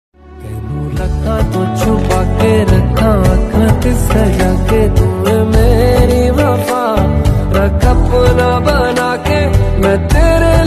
Romantic Hindi Ringtone

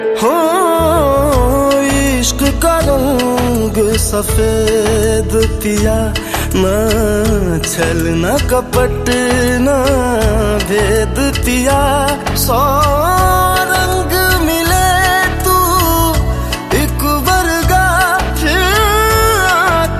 Best Bollywood Song Ringtone

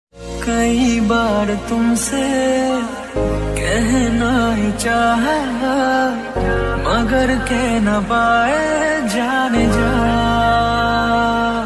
Hindi Love Song Ringtone

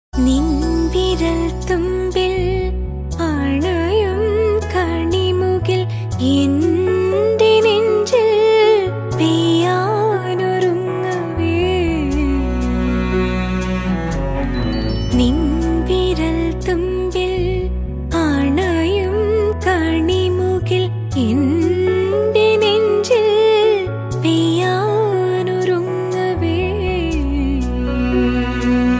Malayalam Song Ringtones

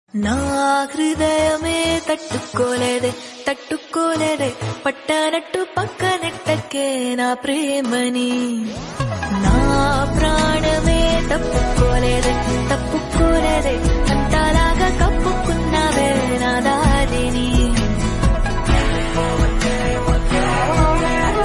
Female Song Ringtone

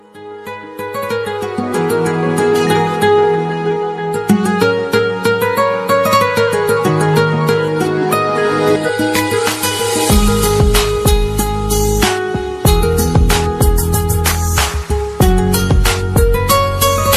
Instrumental Music Ringtone

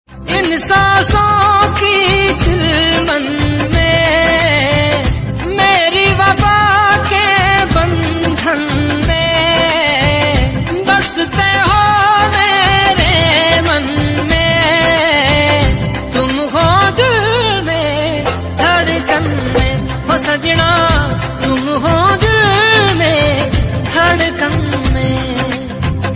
Hindi Song Ringtone

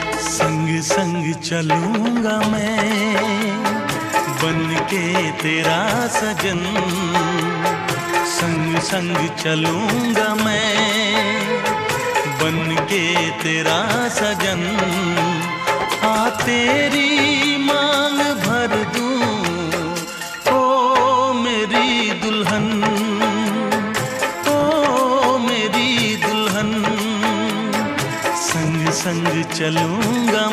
Old Hindi Song Ringtone

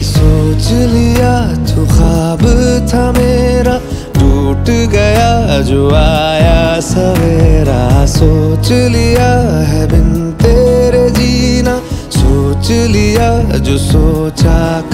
Hindi Ringtone

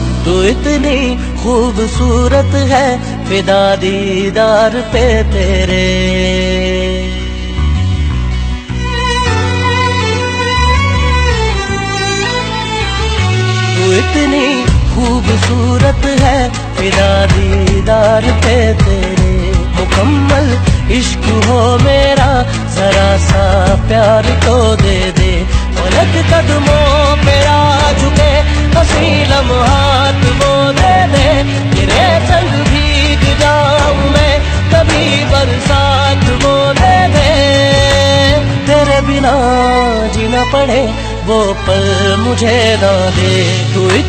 Hindi Ringtone

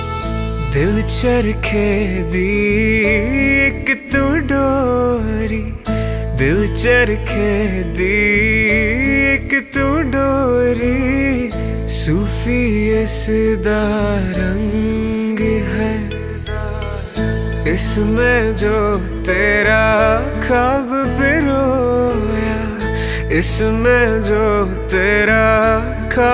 Punjabi Mix Song

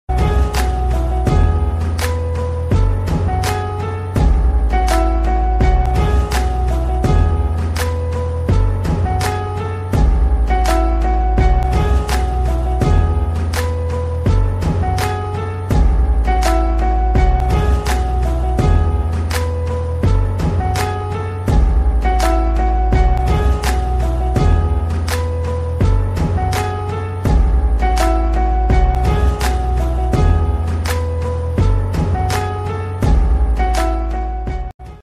Background Music Ringtone; Maula Ya Salli Wa Sallim

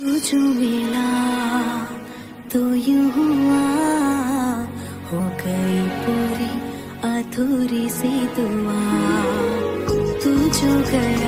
Female Ringtone

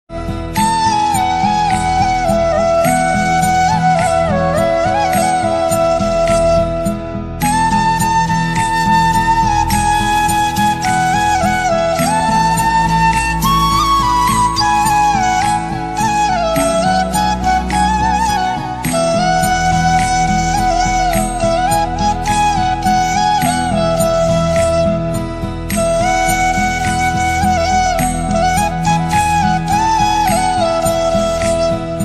Aarti Flute Ringtone; ringtones

